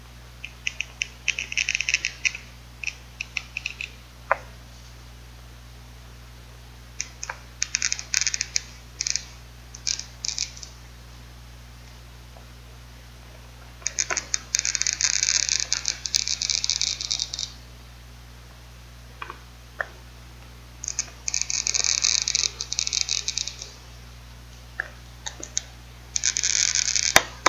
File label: glass cutter across glass jar